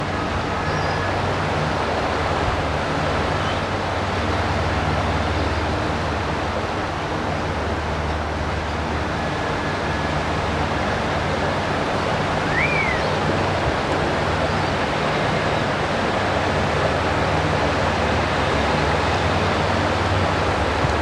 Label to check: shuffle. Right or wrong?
Wrong